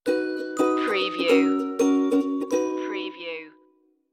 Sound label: happy folk stinger